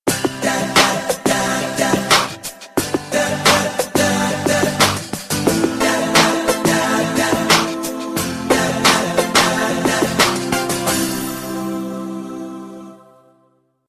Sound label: ringtone; sony ericsson k750i